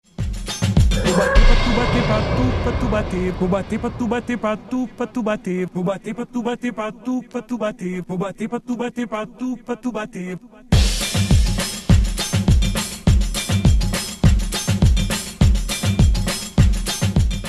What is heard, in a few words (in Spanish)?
la tuba; latino